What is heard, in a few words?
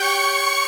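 synth strings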